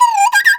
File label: CHINA, Heart of Asia; Spectrasonics; CHINAFLT